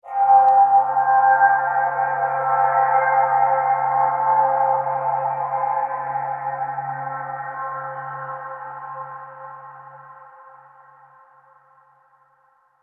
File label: Overlay Drone; Act3 Rezoning